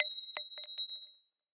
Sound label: 40mm casing; gmod; arccw uc commons attachments